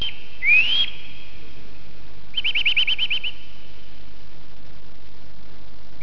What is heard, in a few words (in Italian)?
picchio